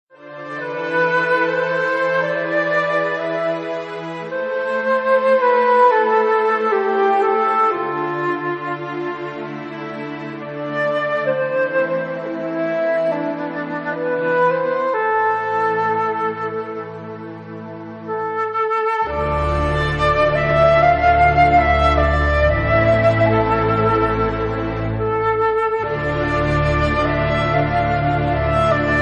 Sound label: flute